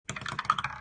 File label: Dice Roll